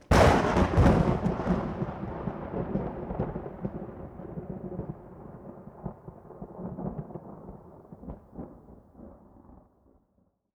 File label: thunder; jungle journey